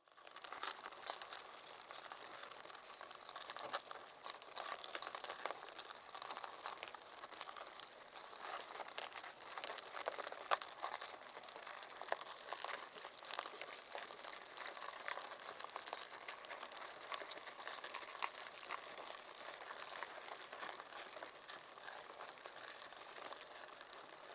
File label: Insects Mealworms, Insects Mealworms Larva Movement Slimy; Bugs; Animal; Insect Sound Effects